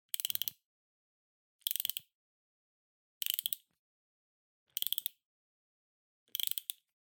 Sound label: Music Box-Foley (Wind Up)